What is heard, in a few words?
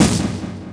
wooden golem move